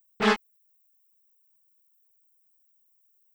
Boo; Hey You, Pikachu!; N64

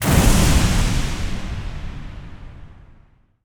Boss Explode Clean; gamerip

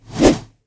Downward Swipe

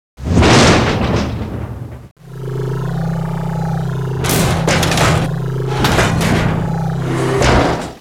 Spaceship Crash; Spore Creatures

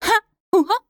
Cloth Burst; Hollow Knight (gamerip) (2018)

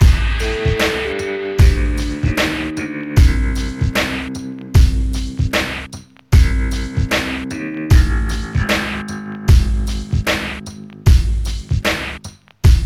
Bpm76 Lo L

